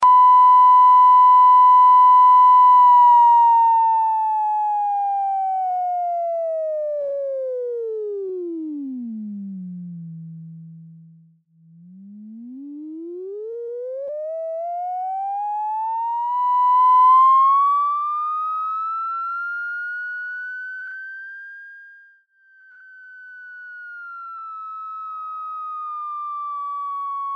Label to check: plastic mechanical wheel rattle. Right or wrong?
Wrong